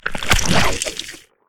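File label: Monster - Foley Attack; Monster Within; Hunter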